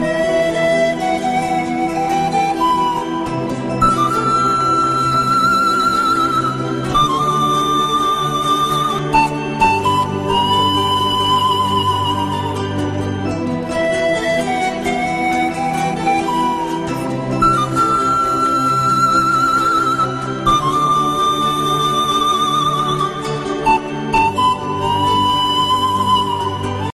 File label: Andes